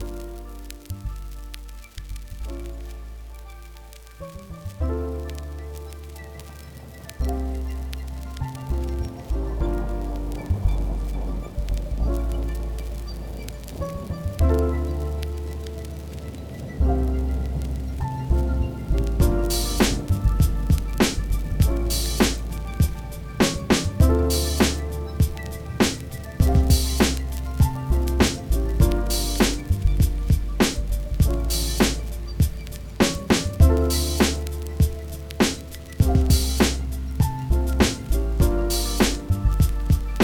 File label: Jazzy